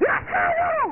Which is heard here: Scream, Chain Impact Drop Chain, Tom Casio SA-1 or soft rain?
Scream